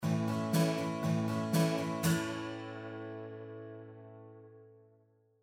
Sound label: PCM81 Chorus